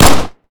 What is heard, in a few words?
fire, glock; weapons; Urban-Coalition; Decay; arccw ud